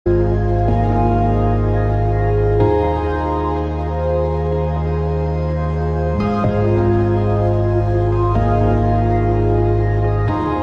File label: pop ambient